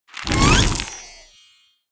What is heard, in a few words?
shuffle in; Candy Crush Friends